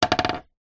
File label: industrial metal ball bearing down on wood